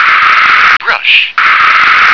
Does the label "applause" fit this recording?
No